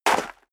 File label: step high heels gravel light jump; schritte; Footsteps; menschen